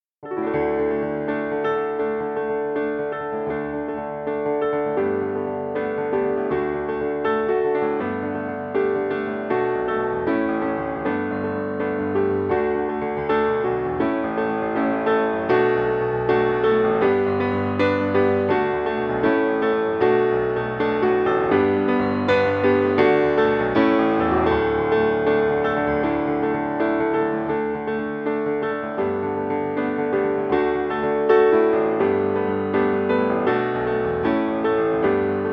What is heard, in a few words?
Accomp; Merida; Mickey Wndr Book